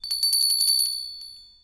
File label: SYSTEM SHOP BELL